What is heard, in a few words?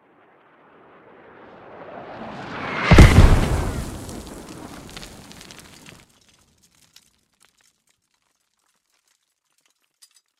mortar impact 50m; gmod; better mortar